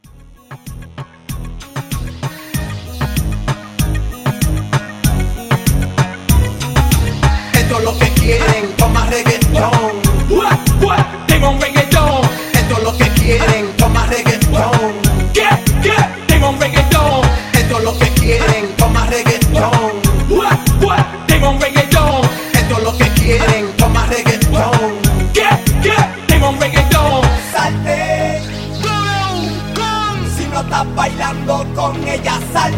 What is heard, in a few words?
Reggaeton Hype 96